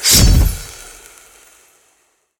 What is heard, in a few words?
activate Walking Wild; netent; narcos